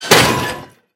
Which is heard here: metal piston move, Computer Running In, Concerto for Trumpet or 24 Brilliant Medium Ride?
metal piston move